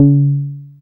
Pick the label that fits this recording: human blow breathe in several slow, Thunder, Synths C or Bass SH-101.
Bass SH-101